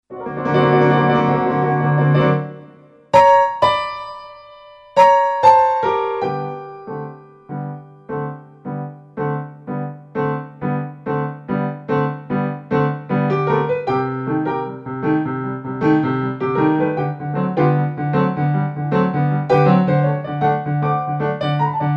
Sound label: piano; piano kurs